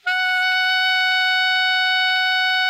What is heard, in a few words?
ALTO MF F#4, Sax; Greytsounds; Philip Wolfe David Crozier's Saxophones